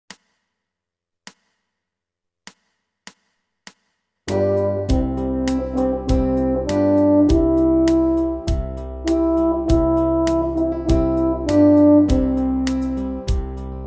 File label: Worried Man Blues